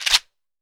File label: CHEKERE; AKAI S-Series CD-ROM Sound Library VOL-5